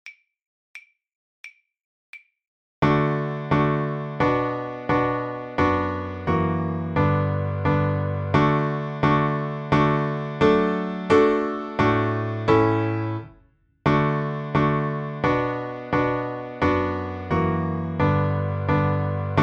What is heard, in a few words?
Battle Cry of Freedom accomp, violin; The Violin Fun Book (Level 2)